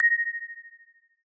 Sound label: correct